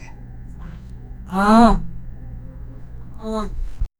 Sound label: there is a danger soft, danger